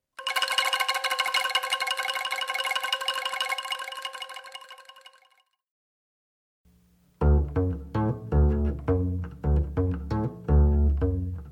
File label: World Music Instruments